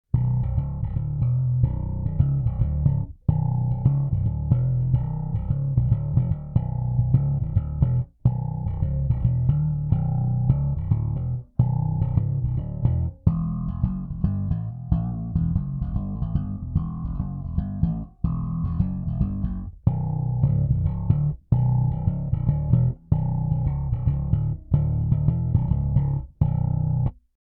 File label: Thump DI Post; SKC Bogart